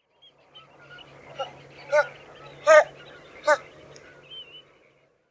Great Cormorant Phalacrocorax carbo; waterfowl

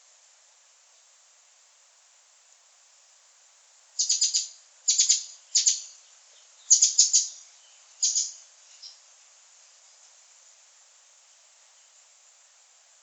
Northern Mockingbird Mimus polyglottos; thrushes thrashers

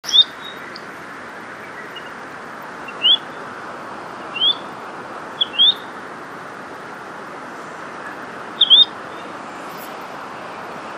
Semipalmated Plover, Charadrius semipalmatus; shorebirds rails

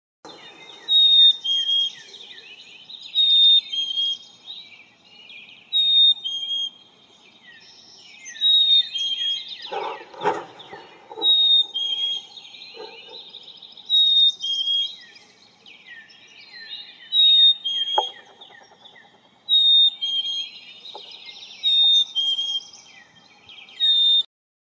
Black-capped Chickadee, Poecile atricapillus; chickadee nuthatch titmice

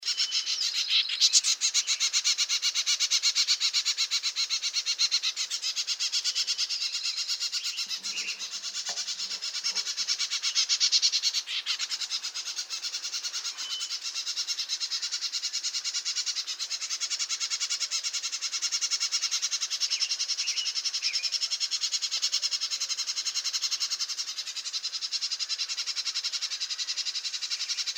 Bell's Vireo, Vireo bellii; vireos

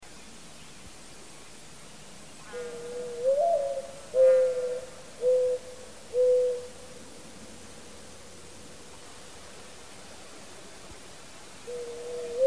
Mourning Dove, Zenaida macroura; doves pigeons

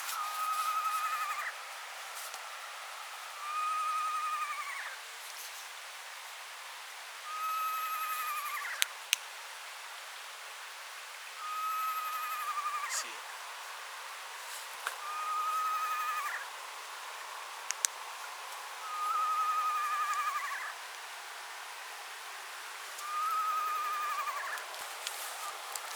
Eastern Screech Owl, Megascops asio; owls

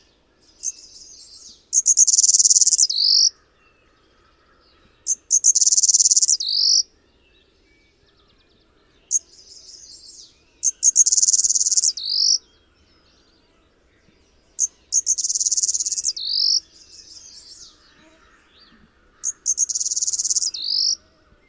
Blue-winged Warbler Vermivora cyanoptera; warblers

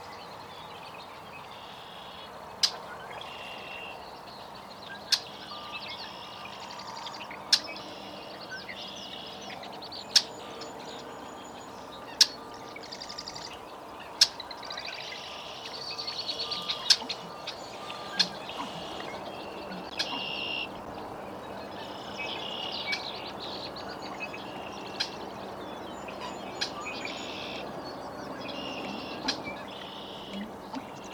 Red-winged Blackbird, Agelaius phoeniceus; blackbirds orioles

